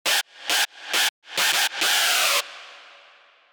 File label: Screech F 205bpm, fx